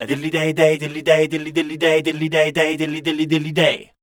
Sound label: SCAT #D, Voice